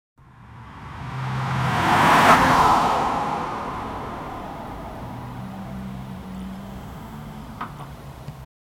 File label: wreck cars knockdown